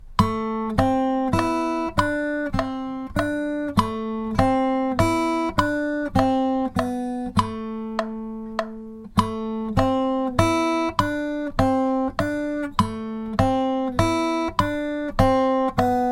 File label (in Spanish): melodia en compás de 3x4